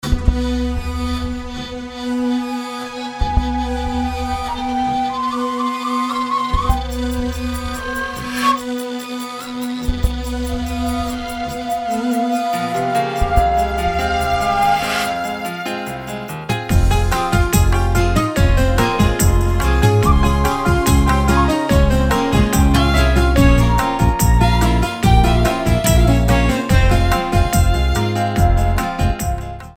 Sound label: Ethnopop